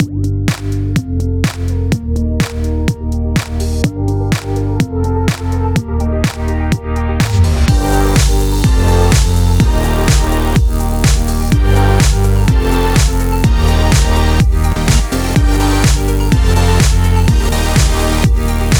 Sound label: Entertainment Bed; Sitting Duck Music; Good Morning Britain